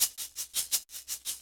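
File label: drum and bass Shakerloop; Dance DJ; Percussion; Shakers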